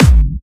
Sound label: Bassdrums Clubby Kicks; Vengeance Samples